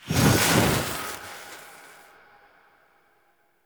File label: Fire Push Cast; Powers; Fenn